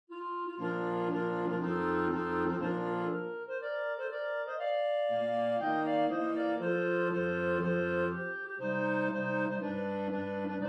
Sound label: March Wind Sextet Clarinet; Beethoven